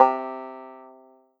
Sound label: Yamaha MU90R Banjo C4